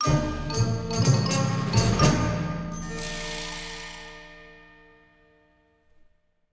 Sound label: unlock bonus; EyeToy Play Hero; PlayStation 2